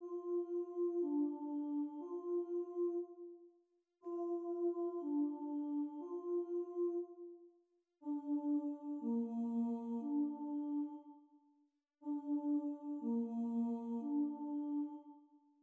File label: buzzing exercise, trumpet